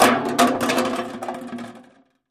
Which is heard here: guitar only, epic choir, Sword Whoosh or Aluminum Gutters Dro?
Aluminum Gutters Dro